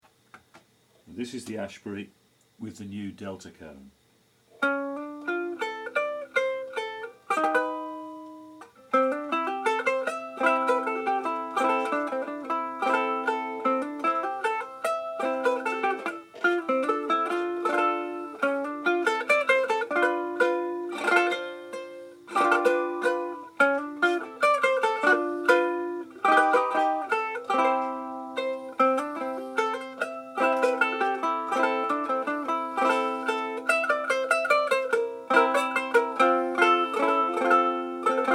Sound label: Ashbury new cone, resonator